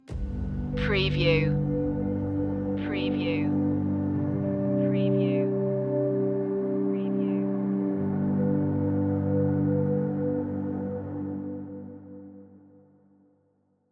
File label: minimalist stinger